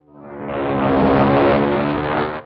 stuka dive

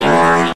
fart funny

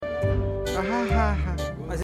sertanejo

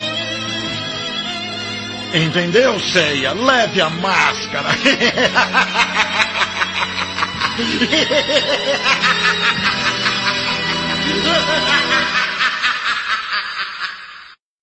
hihihihehehehahahahaha

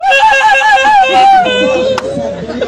police scream

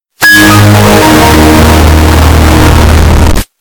Windows shutdown amplified

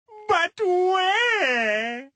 shock

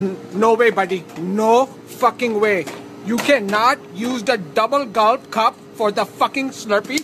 double gulp

